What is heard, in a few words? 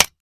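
Mouse Trap Single Snap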